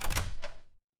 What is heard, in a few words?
door open